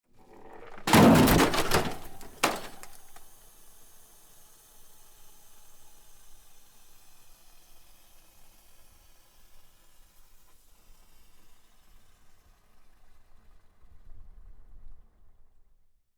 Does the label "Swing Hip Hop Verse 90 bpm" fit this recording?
No